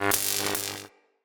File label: Aoe Shockwave Accent